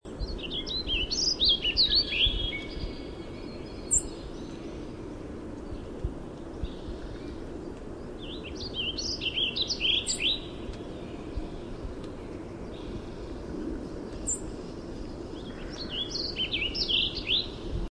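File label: Warbling Vireo song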